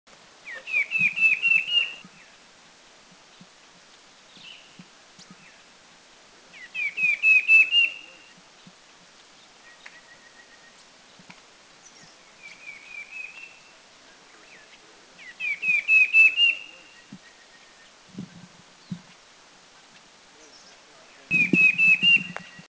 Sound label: Spot-breasted Parrotbill, Thailand